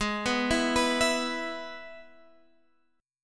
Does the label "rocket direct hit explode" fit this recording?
No